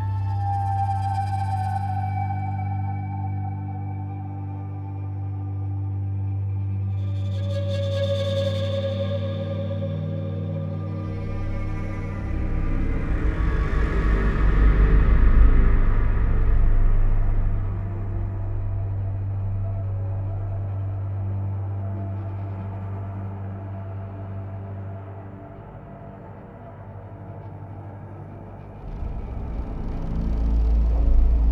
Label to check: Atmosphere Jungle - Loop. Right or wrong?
Right